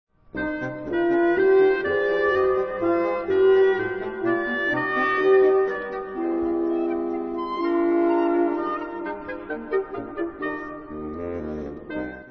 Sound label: trio; haydn